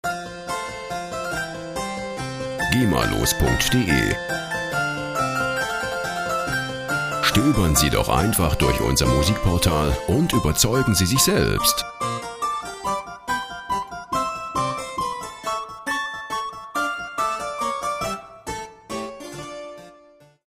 cembalo

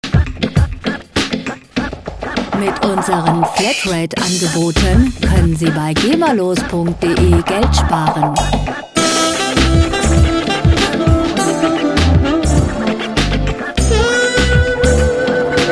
cool sax

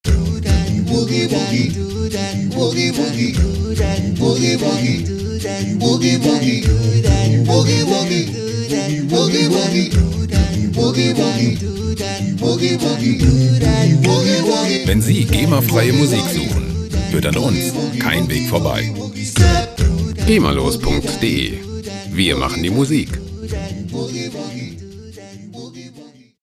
boogie woogie step

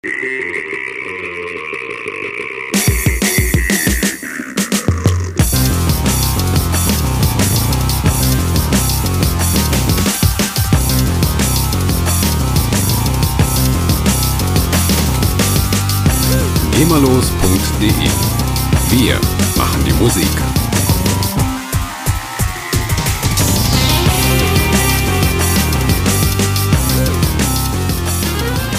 electro

